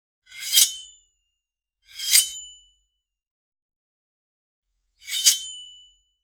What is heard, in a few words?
the sound of a sword, sheath